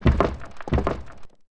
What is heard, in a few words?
Coffin